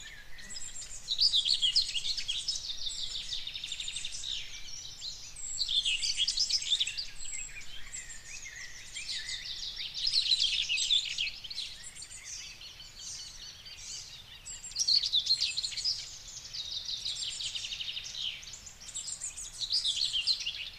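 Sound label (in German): dorngrasmücke Spreewald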